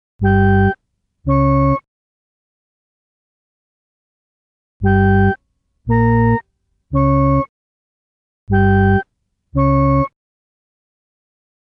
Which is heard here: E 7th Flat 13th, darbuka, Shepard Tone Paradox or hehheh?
Shepard Tone Paradox